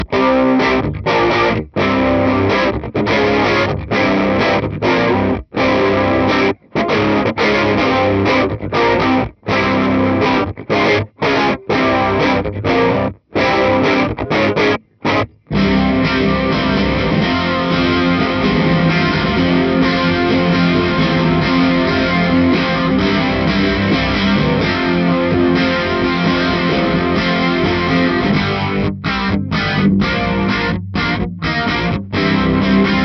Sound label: Crunch; true rock tone